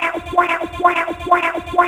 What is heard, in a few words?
House Loops, Vocal Loops House 128BPM